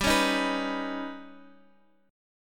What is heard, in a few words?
D sharp Augmented 7th G